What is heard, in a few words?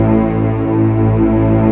STR, Orchestral Strings